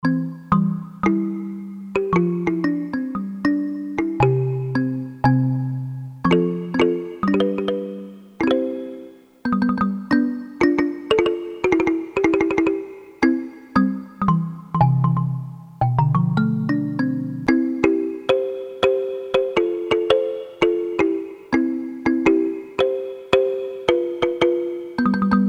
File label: Kalimba, Ethnic Perc, Korg DSS-1